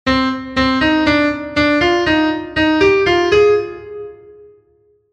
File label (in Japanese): bluenoteスケールフレーズ5